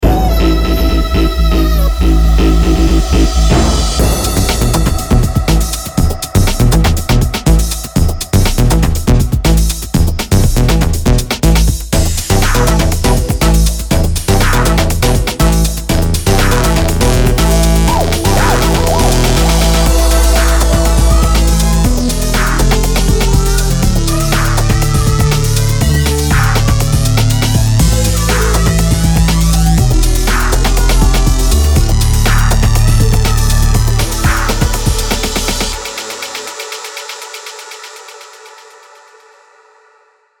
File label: ANALOG BASS